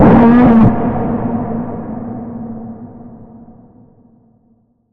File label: Bend, A sharp